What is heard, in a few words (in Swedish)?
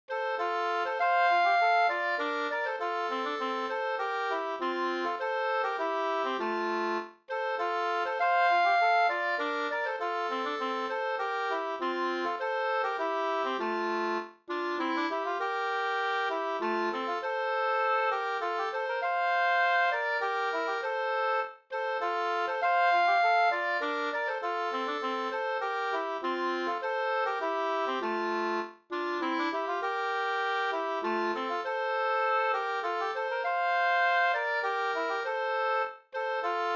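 polska, tvåstämmig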